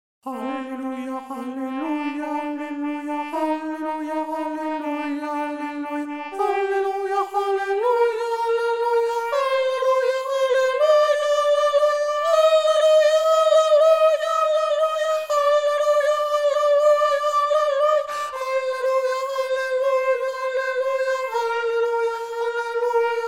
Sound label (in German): Sopran; ALLELUIA